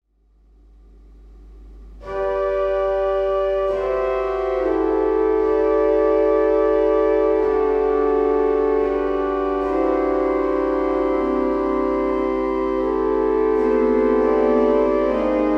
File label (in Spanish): flauta armónica salicional, órgano